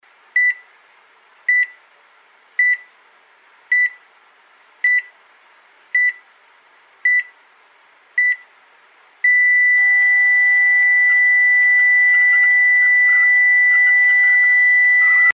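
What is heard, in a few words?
sound of cardiac monitor with stop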